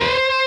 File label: bend full Left-hand vibrato